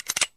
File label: click clack